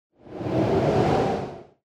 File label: wind; Dystopia; injection